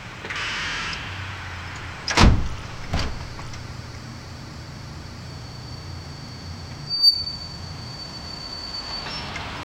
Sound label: two separate cars in a